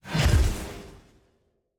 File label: readycheck outro declined